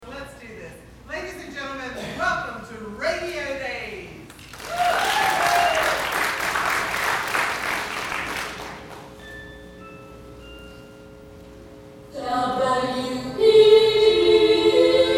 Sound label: JINGLE